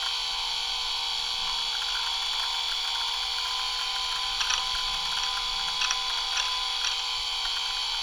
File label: data grab loop; Modern Warfare